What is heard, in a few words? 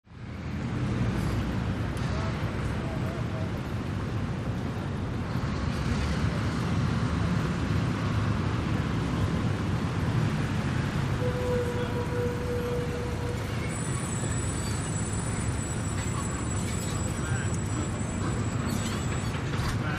Ambience: Heavy City Activity with Various Car Bys And Movement And Walla.